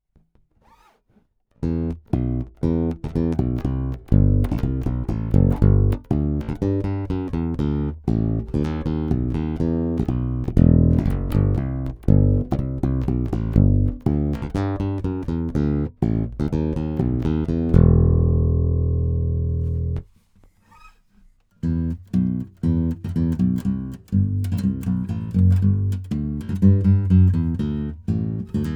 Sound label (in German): Robot 4 Wechselbass